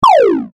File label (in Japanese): ショット